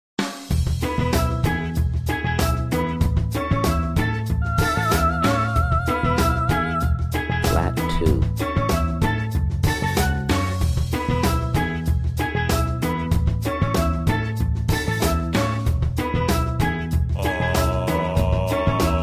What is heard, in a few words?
Key of E Long; real world music ear training